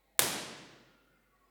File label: IR sweep